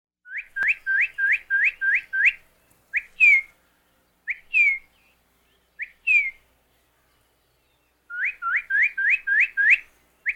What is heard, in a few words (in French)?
Cardinal rouge CARDINALIS CARDINALIS; oiseaux exotiques